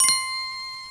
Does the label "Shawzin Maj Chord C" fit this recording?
No